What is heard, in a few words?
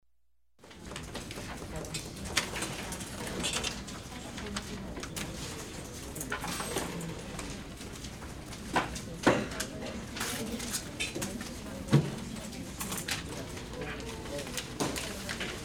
Classic Light Office SFX